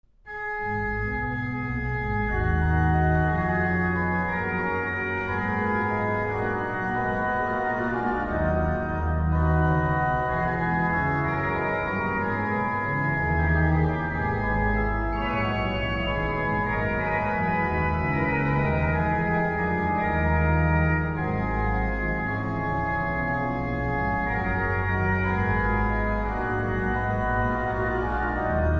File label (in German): orgel; Bach; orgel-b chlein; jesus christus unser heiland der den tod